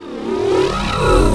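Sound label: Targeting; Space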